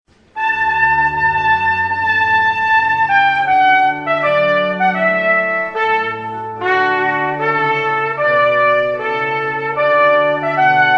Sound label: trumpet tune